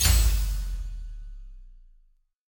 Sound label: walking Wild Scatter; wilderland